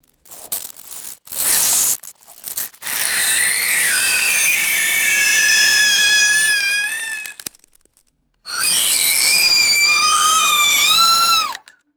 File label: high pitched dry ice metal screeches; foley